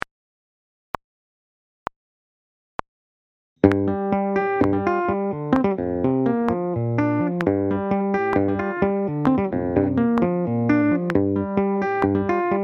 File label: Metal Rhythm Guitar